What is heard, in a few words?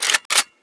famas cock, weapons